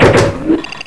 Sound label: button; XDM; buttons